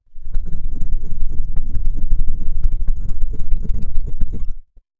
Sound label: sound effect of, movement